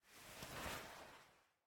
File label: Move Start Eat; Game rip; MiSide